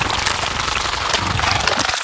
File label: latched loop; Barotraumatic; Huskcrawlie1